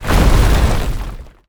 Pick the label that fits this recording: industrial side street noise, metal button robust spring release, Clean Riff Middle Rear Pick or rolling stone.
rolling stone